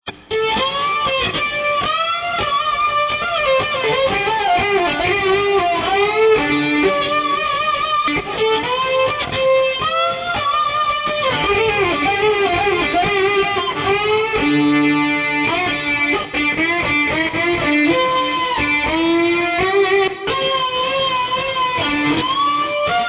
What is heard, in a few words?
erhu